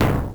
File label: Band Kick; Shining Force II; M8; Genesis